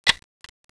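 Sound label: Contax IIIa, camera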